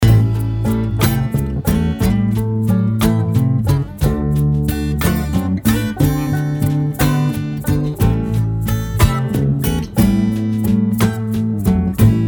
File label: acoustic blues